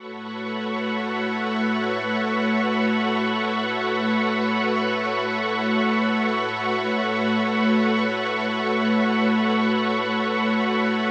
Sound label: Ambi String A6:9, synth orchestra